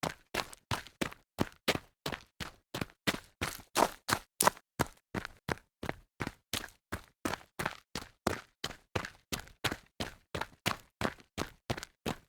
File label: running on gravel